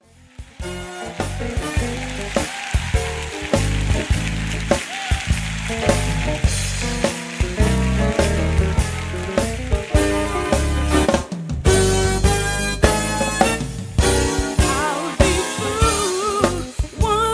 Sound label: ringtone